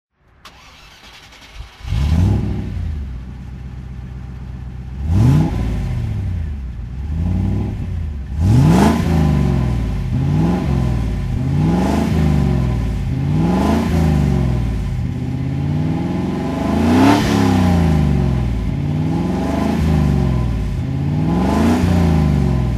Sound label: range rover classic throttle